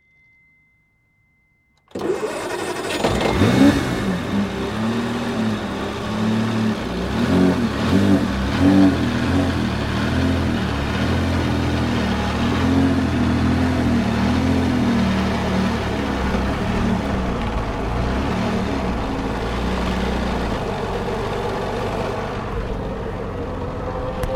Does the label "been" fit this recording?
No